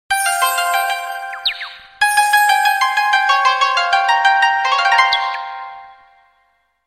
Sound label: music